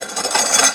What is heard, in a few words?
metal drag